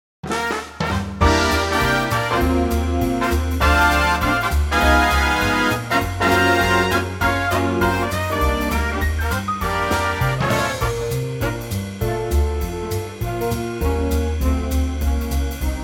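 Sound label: Bb Big Band, backing track; key - Bb - vocal range - F to Eb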